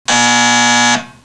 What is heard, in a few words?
buzzer